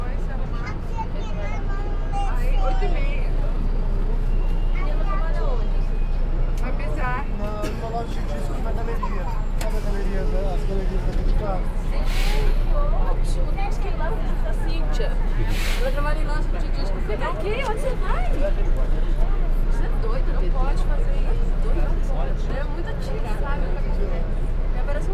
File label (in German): in der metro, Brasilien; Fieldrecordings